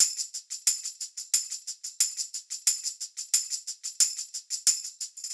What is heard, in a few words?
Percussionist, 90bpm; Percussionist D; Сэмплы ударных (Легкая музыка); Drums; Easy Listening; Ударные